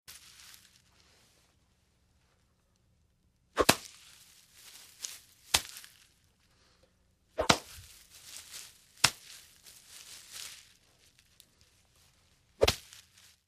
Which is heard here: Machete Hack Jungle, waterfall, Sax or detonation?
Machete Hack Jungle